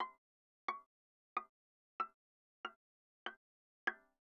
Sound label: Viola pizz sul A mf C6 Ab6